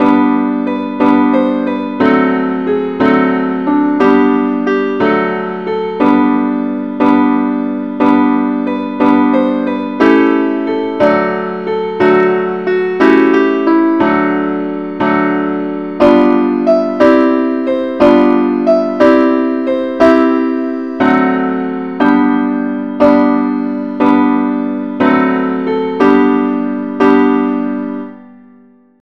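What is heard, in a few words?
angel voices simple accompaniment key G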